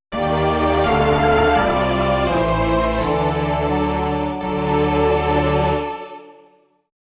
Samus Appearance Jingle; Metroid Prime Series; Metroid Prime 1

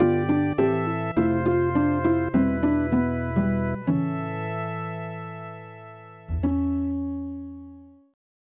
Game Over; Castlevania Anthology; Super Castlevania IV

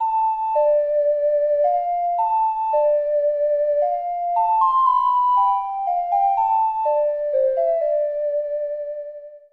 Ocarina; Nintendo 64; Legend of Zelda, The - Majoras Mask

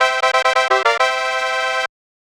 Bonus Fanfare; Super Nintendo; Super Mario World 2

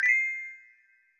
Correct SFX; Banjo Tooie

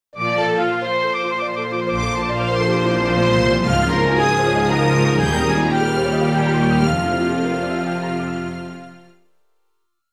Ballad of Gales; Legend of Zelda Series; Wind Waker

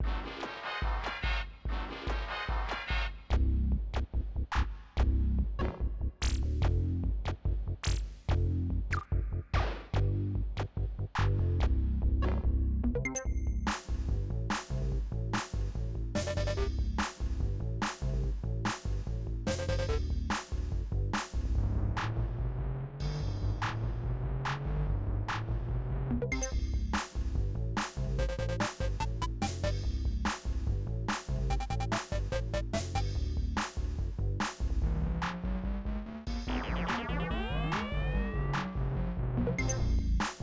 Heavy Machinery, Castle Machinery; Crash Bandicoot

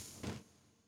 Switch to Fire Eggs; Banjo-Tooie

